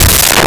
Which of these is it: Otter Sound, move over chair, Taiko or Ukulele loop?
Taiko